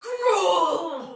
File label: Pain, Soldier Female, Battle; Dialog; Barks; Sinecure